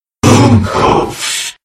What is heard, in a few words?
backstab; freak fortress 2; nightmare